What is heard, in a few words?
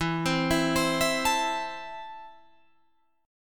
E Suspended 4th Sharp 5th, Guitar Open F; Esus4#5; arpeggiated; 16,19,16,19,19,16